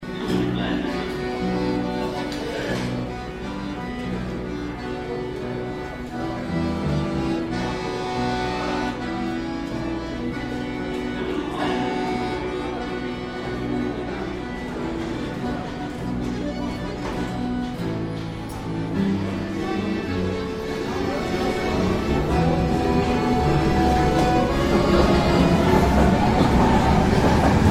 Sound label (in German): U-Bahn-Station Berlin Strassenmusik